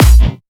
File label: Distortion Kicks, Bassdrums